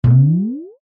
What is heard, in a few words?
cartoon bounce drum like rising tail; zapsplat